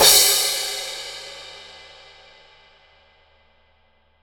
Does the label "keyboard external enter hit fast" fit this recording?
No